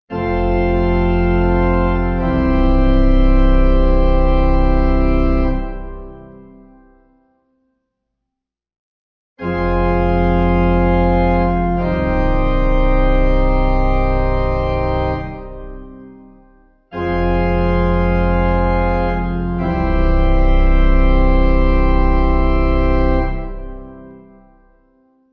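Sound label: Amens C Pipe, Pipe Organ; Simple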